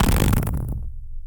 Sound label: explosion